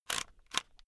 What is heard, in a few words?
headphone extend unsteady